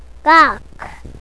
rock

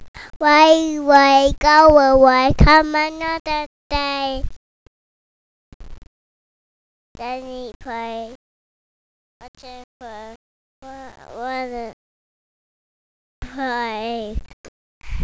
rain rain; Rain Rain Go Away

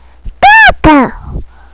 gator

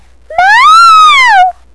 meow

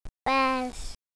buzz

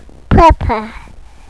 paper

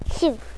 shoe

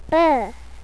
bear

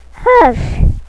horse

